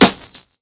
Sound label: Edgy SN, Dirty Hip Hop; STYLE KITS (Hip Hop, Etc)